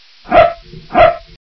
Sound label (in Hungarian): kutya, állat